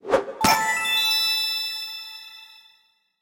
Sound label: random Wilds Fall Down; finn